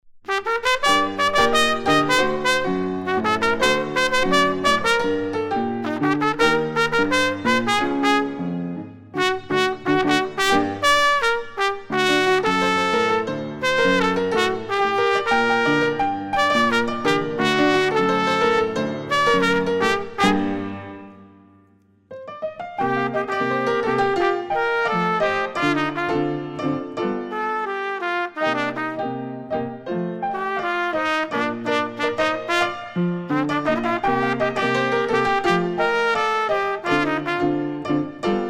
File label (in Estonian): trompet